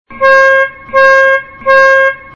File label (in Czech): lodni sirena; kapitan korkoran